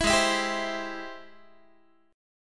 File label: Ab Augmented Major 7th Ab Guitar; 19,16,19,17,16,x; Ab+M7 strummed